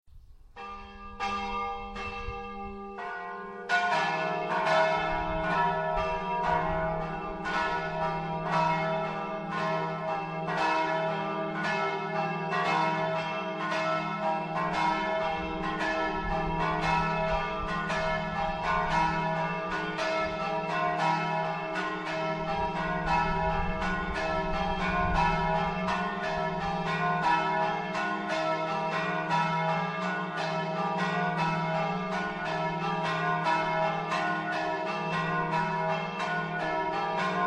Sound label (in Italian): CANEBOLA, campane